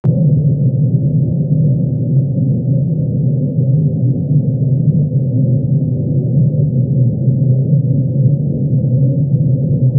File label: chilly underwater